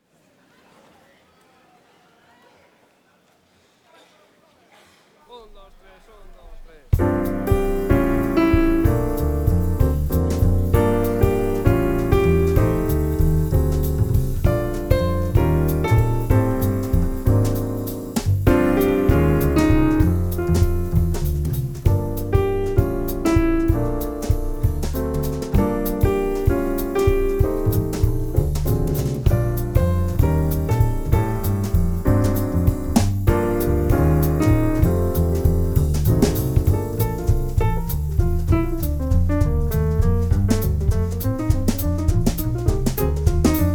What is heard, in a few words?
instrumental